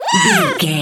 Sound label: goblin fairy attack